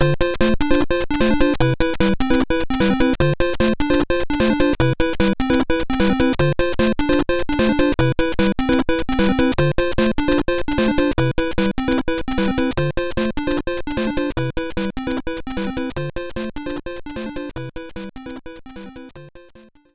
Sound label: BGM Muteki; Super Mario Maker